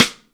RIMSHOT, Ragga & Dub Reggae

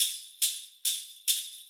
bells; ILIO - Ethno Techno

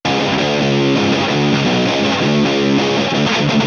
INST LOOP; AKALIX; koba-chan; M8; random and out of place

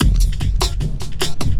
LOOP, Drum & Bass, 150 BPM

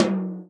tom, Real Drum Kit, jungle kit

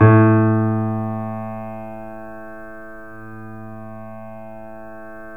A, The Piano; InVision Interactive

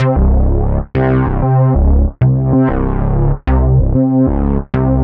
Modu Knocka 95, horrorscape samples 95bpm

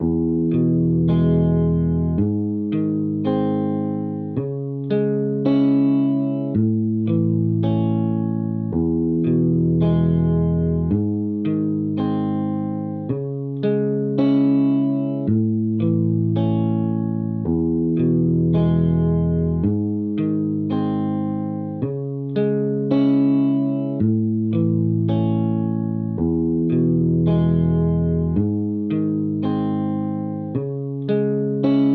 Main Guitar, 110BPM Emaj; 99Sounds; Monosounds; Music Loops; PANDORA BOX!

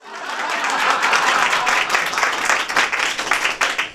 HAHA CLAPS; ClapsOohsAahs; SFX

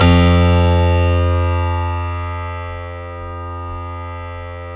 StereoGrand, Keyboards; E-MU Producer Series Vol. 2 – More Studio Essentials

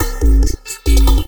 Bumpers; 808FLG Fx; Sonic Foundry (Sony Creative Software); Esoterik Beatz

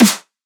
Snare Deep Bass House; PUNK VS WOLF

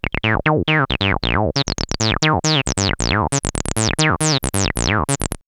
303 Loops; X-Static Goldmine

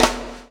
CHAIN; Spectrasonics - Backbeat

